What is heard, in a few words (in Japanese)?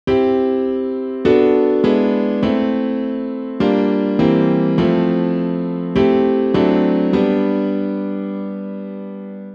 ツーファイブワン